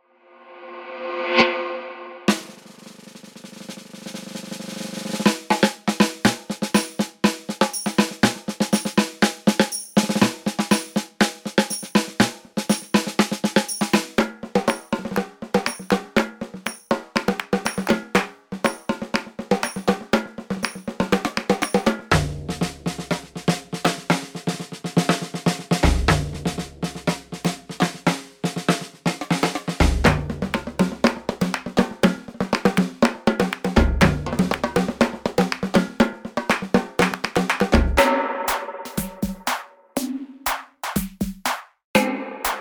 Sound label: samba snare party; Snare Pack Deluxe